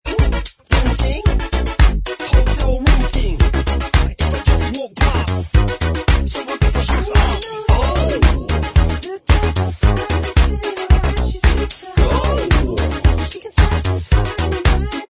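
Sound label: instrumental track, backing tracks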